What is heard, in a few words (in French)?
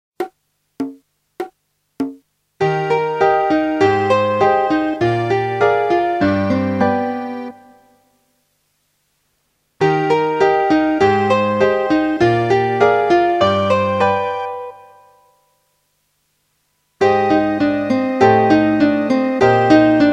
marche en silence